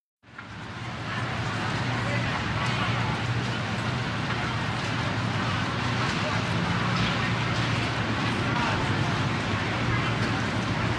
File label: Times Square Traffic, big city